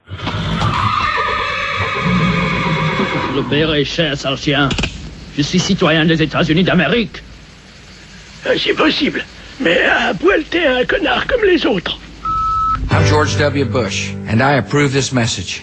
Jingle USA

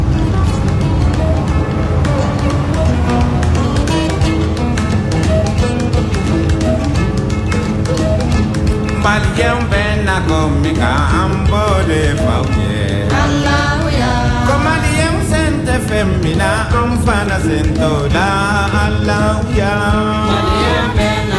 Chants africains

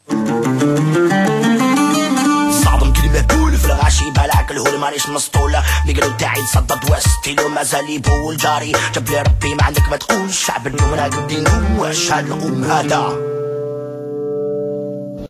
Chanson arabe

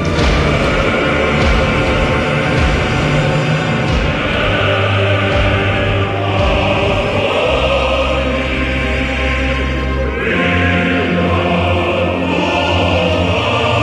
Chants russes

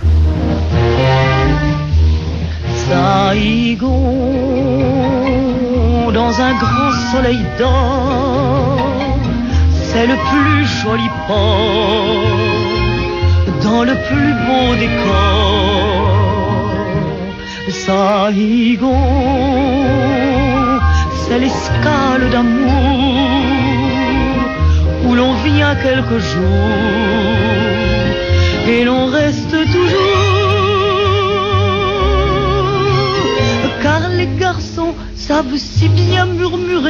Chanson

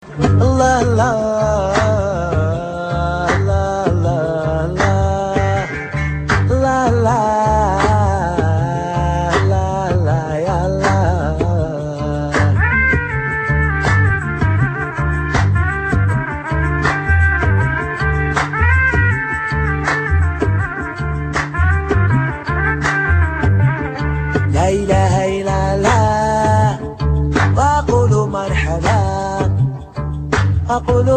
Chant arabe

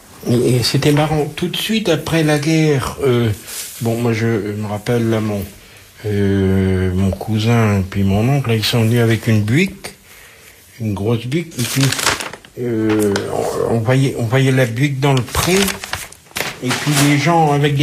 Hymne americain a l acordeon

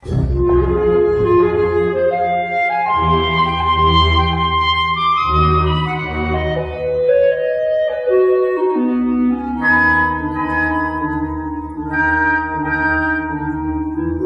Musique classique

